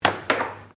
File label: AIR HOCKEY HIT REBOUND, Air Hockey table; Games